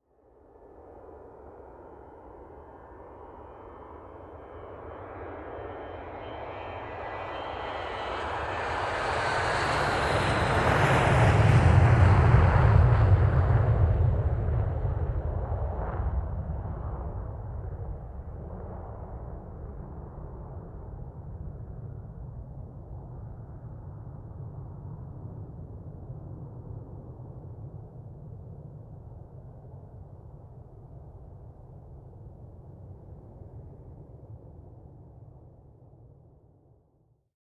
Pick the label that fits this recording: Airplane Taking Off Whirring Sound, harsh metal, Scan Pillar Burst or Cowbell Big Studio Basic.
Airplane Taking Off Whirring Sound